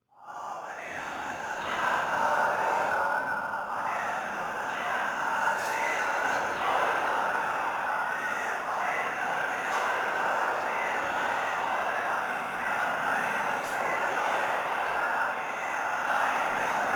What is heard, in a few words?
drone whisper